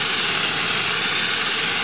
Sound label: flare burn; XDM; ambience